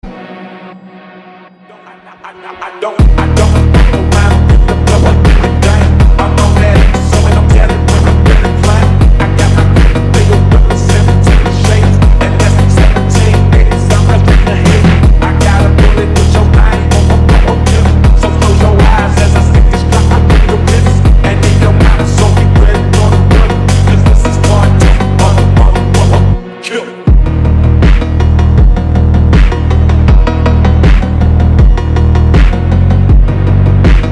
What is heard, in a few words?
Slowed; ringtone funk; آهنگ زنگ فانک